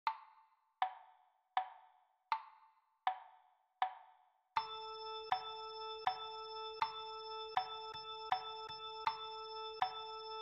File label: Rhythm Patterns A note